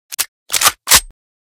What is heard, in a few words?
unjam, weapons; Gewehr Reanimation; librarian g43